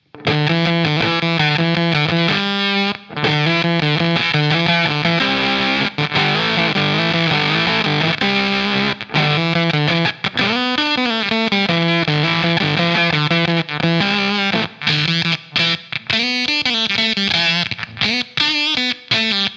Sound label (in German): Tropical Fuzz Polen Alle Regler in Mittelstellung; Beetronics Abelha